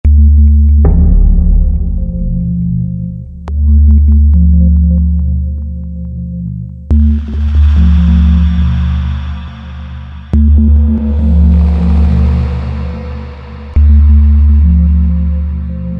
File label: slomo dance